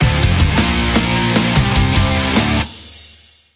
Level Up; Real Basketball; Android

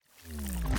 Holo Mural Power On

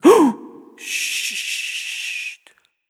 Shutter

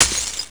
Shatter

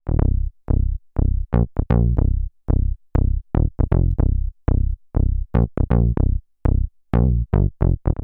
blower 16beats

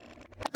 Audio-Log-Player Play

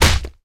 Pirate Rifleman; gamerip

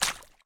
Footstep Puddle

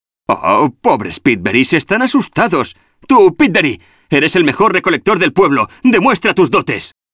Bonus; arthur and the revenge of maltazard; wii

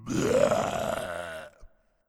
Skel Scientist Vomit

